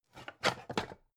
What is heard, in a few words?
pan stack slide soft; objekte; topf